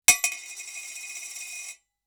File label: coin drop